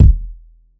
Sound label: bassy kick drum sample e key; kick drum samples